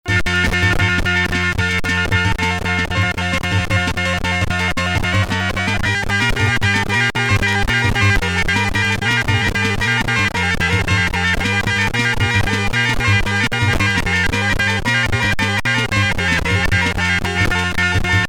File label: Instrumental